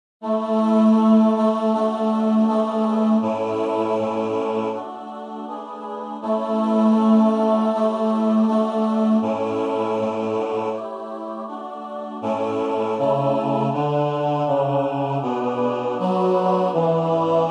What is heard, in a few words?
Kyrie bas; Mendelssohn